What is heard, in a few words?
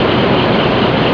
fan blow